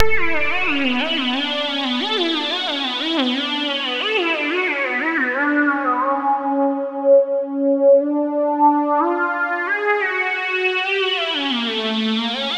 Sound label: glissando; synthesizer; lange-nacht-2009